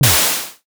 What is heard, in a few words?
stone drop water